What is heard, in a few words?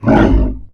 Hit